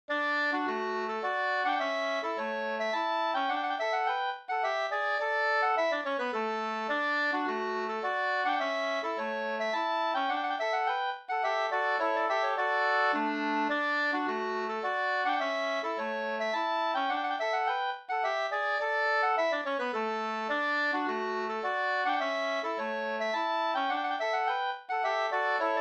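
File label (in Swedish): slangpolska, two-part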